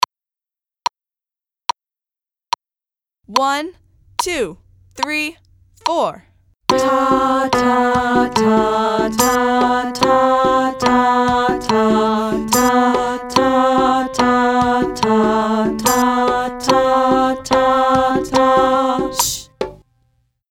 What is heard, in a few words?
Piano Special Click